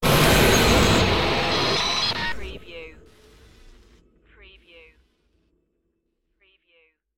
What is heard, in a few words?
sudden extraterrestrial appearance